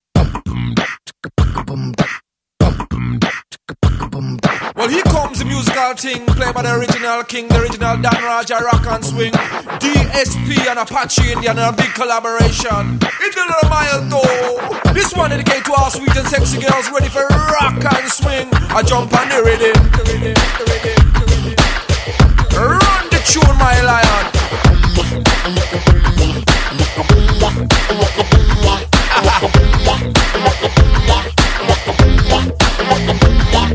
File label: BG Beat Ringtone